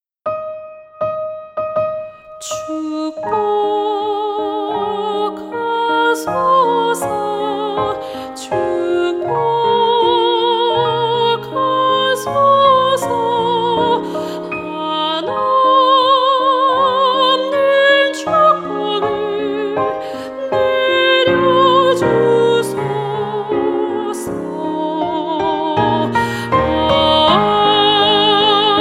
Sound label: SOPRANO